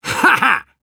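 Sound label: laugh short, Russian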